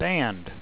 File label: band